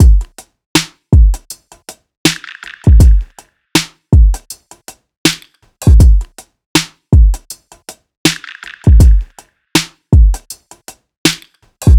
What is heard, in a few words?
drums 80, Full Drum Loops; Slate; SEISMIC Drum Sample Pack